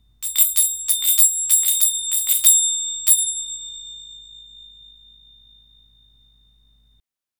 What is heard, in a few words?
ghawazee german silver zils